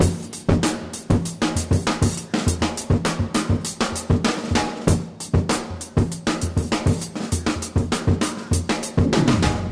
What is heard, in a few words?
break